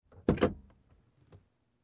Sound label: Opening compartment in car